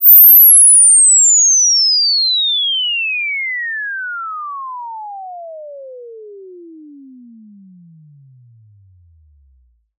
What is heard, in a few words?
Invsweep